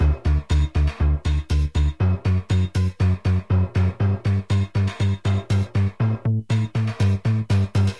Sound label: Factory Bass